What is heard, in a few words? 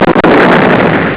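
explosion; Amiga Soundtracker Sample Packs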